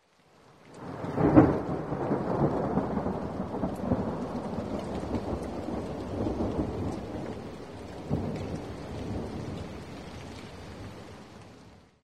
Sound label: rain